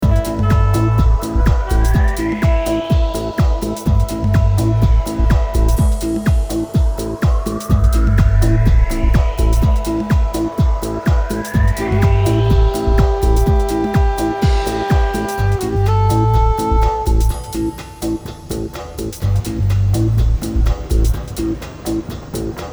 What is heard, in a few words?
loop